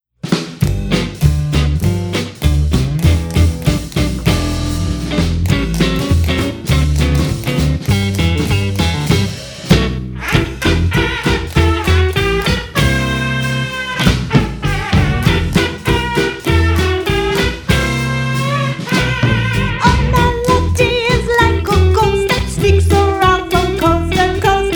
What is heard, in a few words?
Syncopated Boogie; Boogie Boo Collection